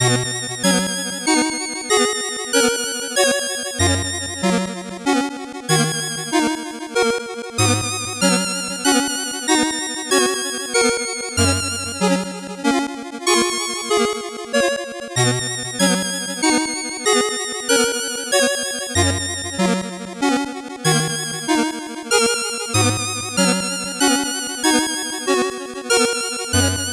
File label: 8bit bgm slow